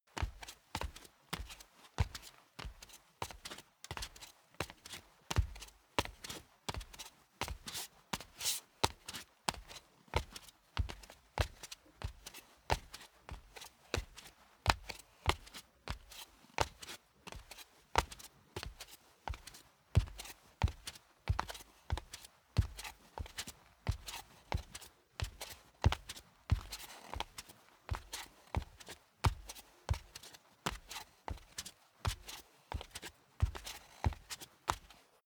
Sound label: step barefoot wood parquet walk sequence; schritte; Footsteps